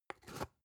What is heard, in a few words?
spice jar big scratch close soft; industrie wirtschaft; gastronomie